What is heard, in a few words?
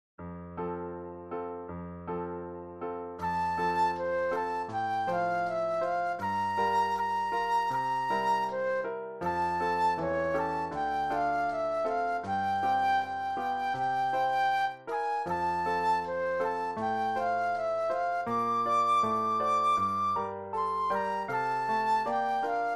Flute Solo; classical